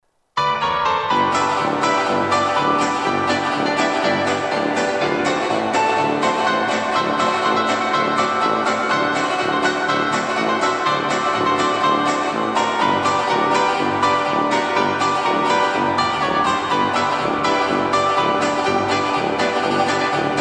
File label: Chamber of Laughs; Rage